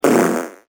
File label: fart machine